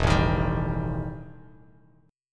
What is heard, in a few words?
E Minor Major 7th Double Flat 5th E Bass Standard; strummed; JGuitar